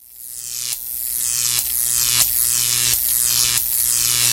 Electro Ring Ton; N97

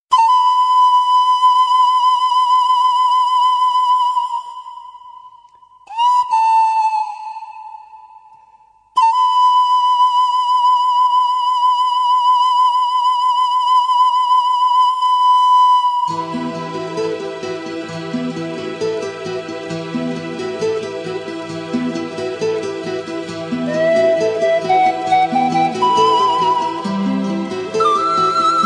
Okarina

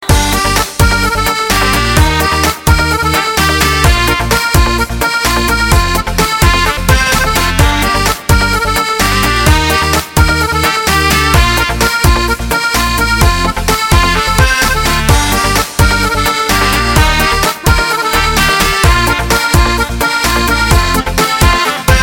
Saxo Phone

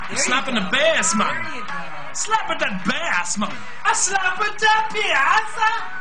Slappin Da Bass

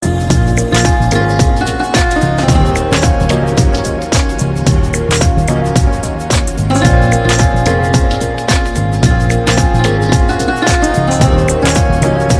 Music

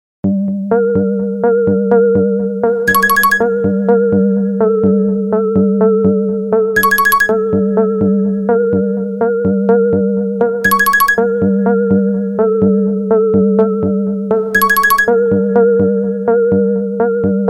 Sad Song Tone

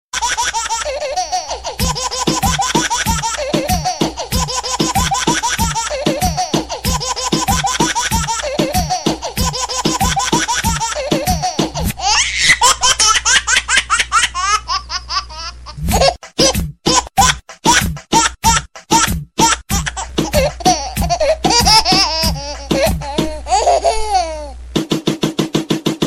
Baby Sms

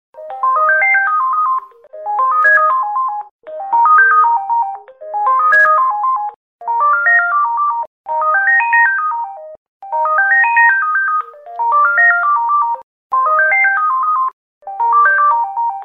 Handy Ring Retro

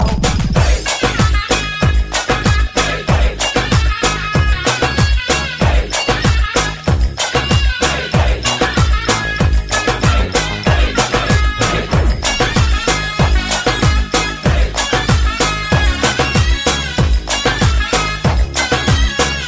Turkish

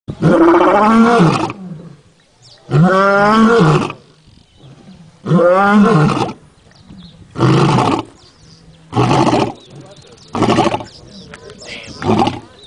Lion Roaring

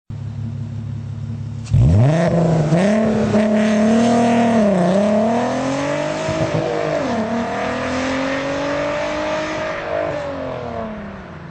R32 Turbo Exhaust

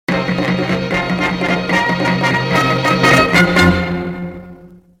Breaking News Alert

